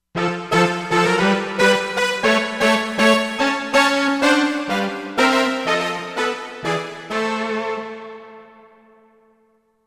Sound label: Hiti Brass, Korg MSC-13S Ethnic 2